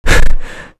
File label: gasp; gesource; player